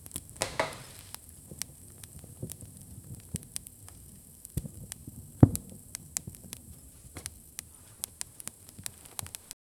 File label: the soft crackling of a, indoors